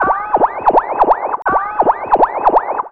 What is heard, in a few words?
Oh Oh Oh Siren, Vox FX; Total Drum&Bass